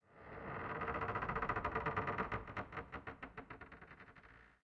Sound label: desert; forgottenruin